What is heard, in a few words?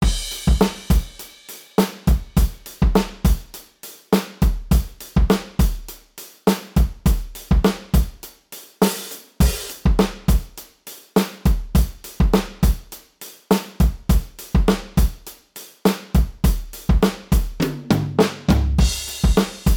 modal surf, drums